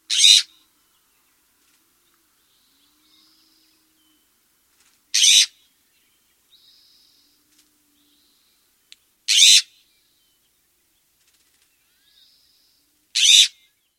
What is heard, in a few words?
western scrub jay